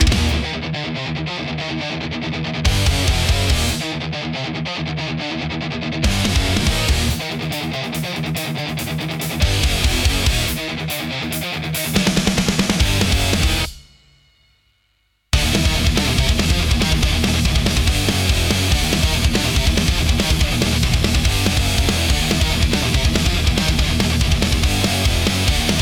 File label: hard rock for powerful